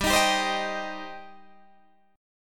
G Suspended 4th Sharp 5th G, down, Guitar, Standard; Gsus4#5 strummed; JGuitar